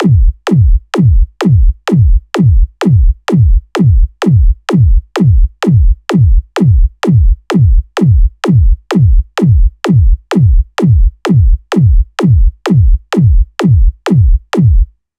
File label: Beat Magnitofon 128 bpm